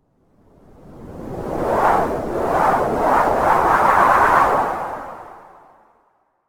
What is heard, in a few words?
whoosh Tournant